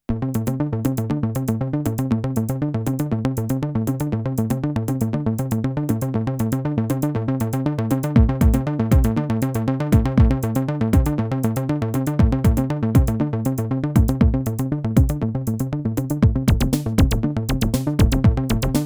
seq, korg ms20 synth; sequence: MS20 + drum samples

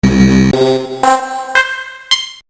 amiga Tine Wave

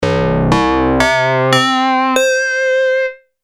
dx21 metal keys

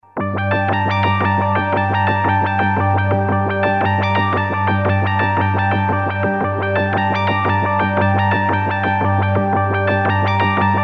synth, yamaha sy99

